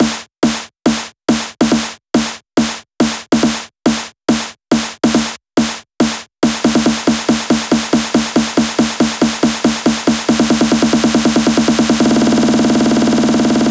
Fills, Loops 140 BPM

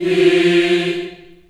F3, Choir

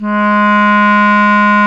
Clarinets

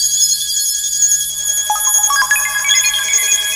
Ambi FX; Zero-G Jungle Warfare 1, 2 & 3; 90 s Sample CDs

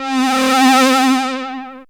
syn c, Dance Synths, Juno; Juno Jam

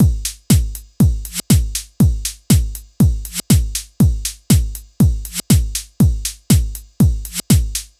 120 trance beat, Drum & Perc Loops; Trance Explosion Vol1

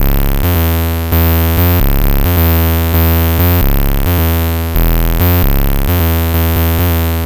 Synth Bass

